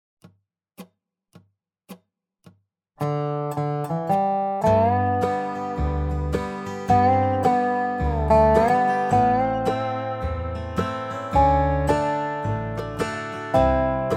basic melody Dobro with backing track; Holiday 2025 Dobro Zoom Workshops